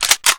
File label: lmg reload